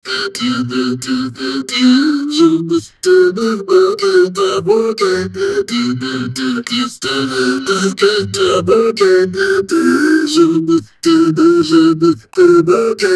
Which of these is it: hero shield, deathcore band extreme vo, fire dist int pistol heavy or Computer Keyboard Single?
deathcore band extreme vo